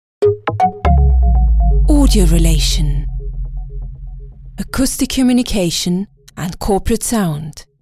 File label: acousticlogo